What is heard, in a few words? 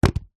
tree log small rotten hollow drop or set down ground; foley